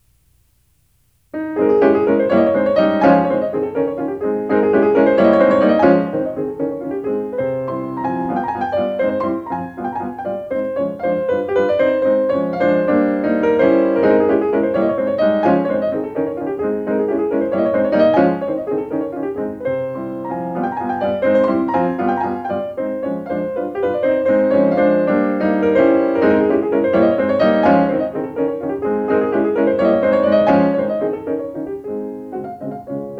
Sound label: Mazurka op7; Chopin; Rubinstein